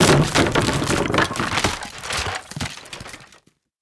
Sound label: wood gib; Rust